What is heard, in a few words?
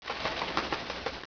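butterfly flap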